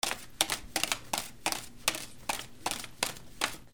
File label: CASINO